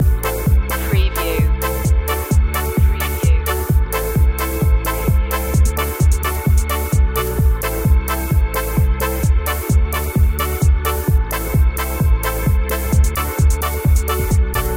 energy ska loop; electronica; melodic

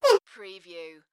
Whoopee Farting Blowing Raspberry Sound; beanstalk audio

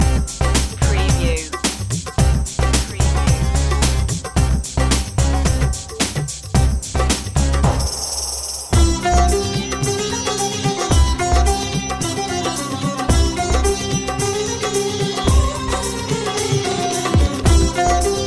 guru steps loop, World Influences Indian

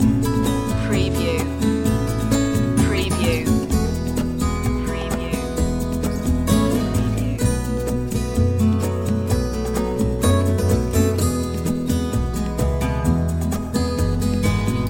loop; cantina

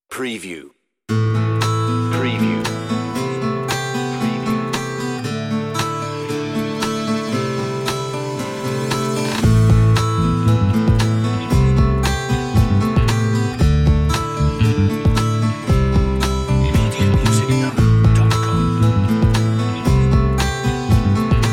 on-hold music

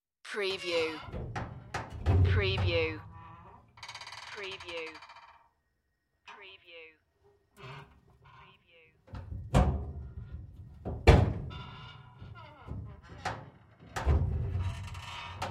metal flap creaking; the sound pack tree

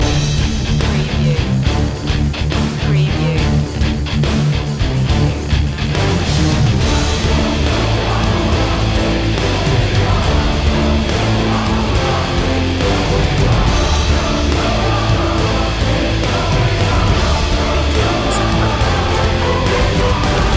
loop, overpowering, No Choir

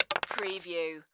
Dice Dropping on Wood; lynne-publishing

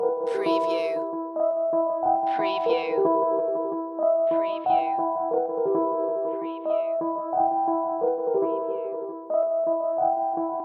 Hip Hop Dark Rhodes Licks 90 BPM Straight - E

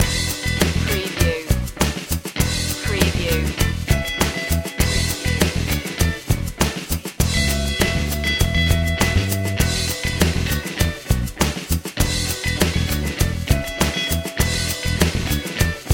slow echoey funk groove, brass section

